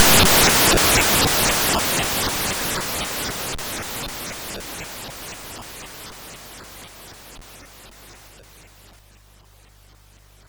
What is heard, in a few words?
Frantic, MOS6581R4